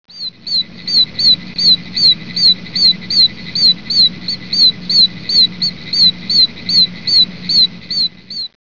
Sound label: oak toad; frogs toads; wildlife